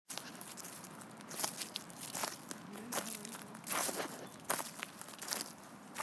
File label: echolocation